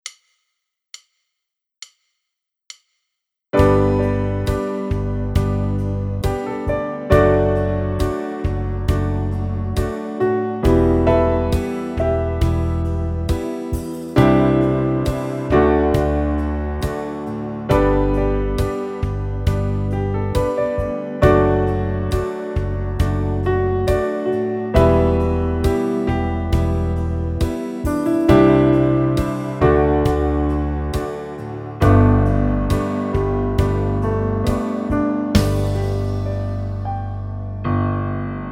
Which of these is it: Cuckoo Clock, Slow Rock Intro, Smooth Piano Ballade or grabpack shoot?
Smooth Piano Ballade